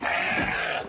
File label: sheep death; animalia